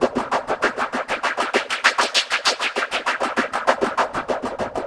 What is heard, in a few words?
Echo Chuff Splat, Synthetic Pulses